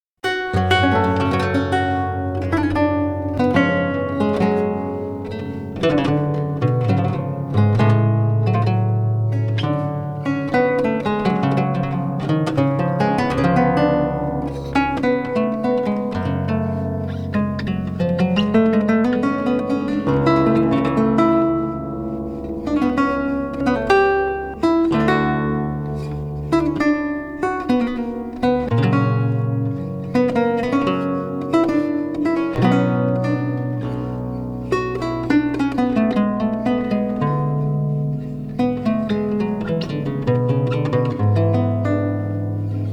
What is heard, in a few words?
Lute Suite in G Minor, Allemande; BWV 995 - II.; Bach; JS Bach - Complete Music for Lute